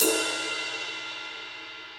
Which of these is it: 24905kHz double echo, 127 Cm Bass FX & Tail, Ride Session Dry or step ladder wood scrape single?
Ride Session Dry